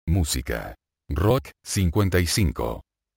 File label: rock